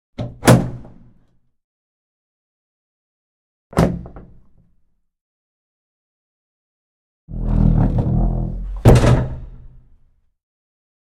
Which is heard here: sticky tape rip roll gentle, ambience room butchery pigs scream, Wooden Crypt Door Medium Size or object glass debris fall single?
Wooden Crypt Door Medium Size